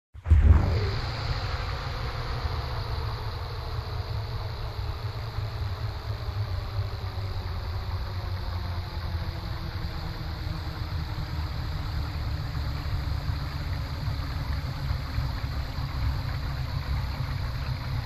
Industrial Water Pump machine turned off

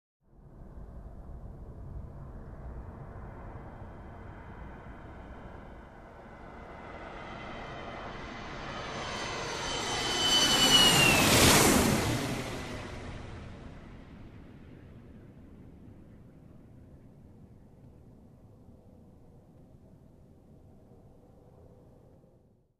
Aircraft Flying by whirring sound

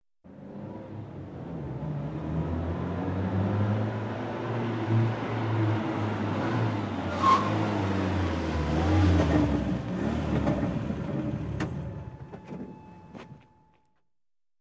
Pickup Truck Approaching and Stopped

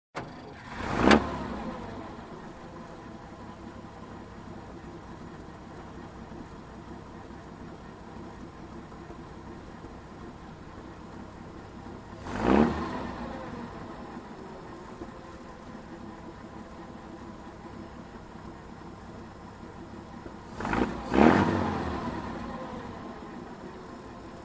Military Truck revs up engine

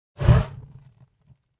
Flamethrower short whoosh

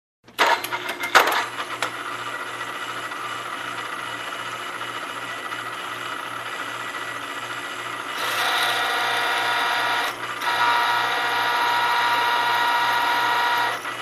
Machine Cutter Turned on and off

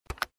Button press

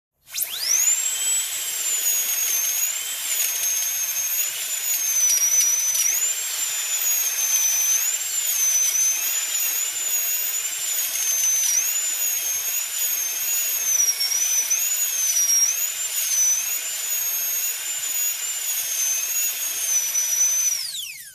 Dentist working with drill

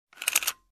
Machine Gear tick

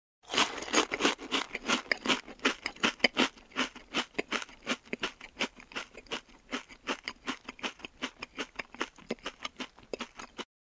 Quick Bite and Chew

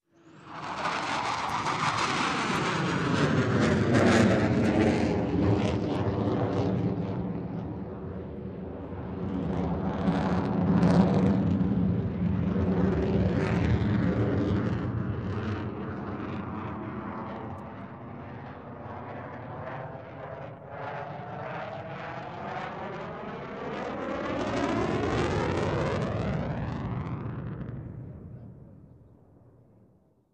Military Jet flying over

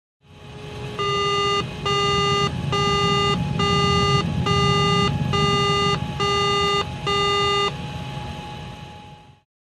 Cockpit Ambience with beep

